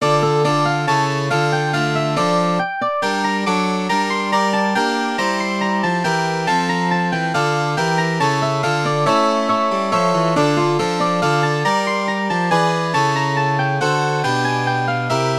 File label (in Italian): RE MINORE Menuetto partitura cello